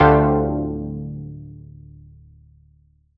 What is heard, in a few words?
Synth Grandiose Dmin9